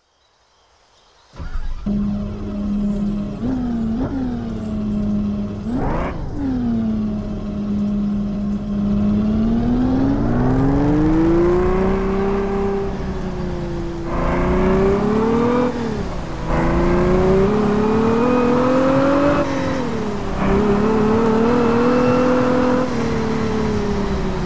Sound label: ferrari 812 superfast